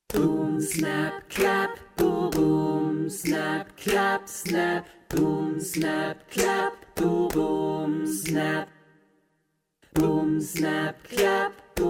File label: boom snap clap